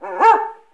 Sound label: dog; G&W